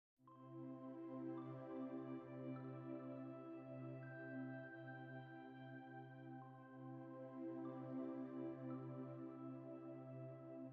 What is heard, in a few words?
A Gentle Touch, Pads Synth